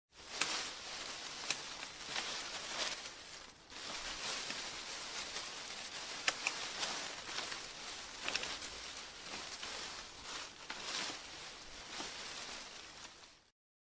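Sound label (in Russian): шорох шелест шуршание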